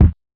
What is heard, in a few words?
Kit Kick, Realistic Concertmate-670; One Shot Kit